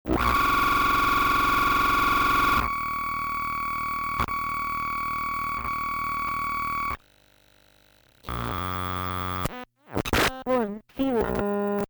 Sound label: Data Noise